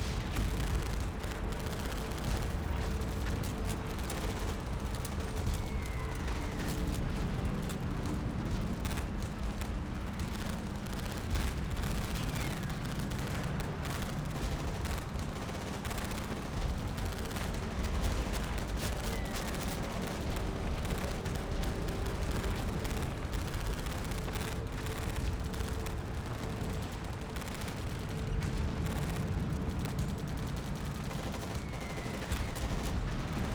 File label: battle; WAR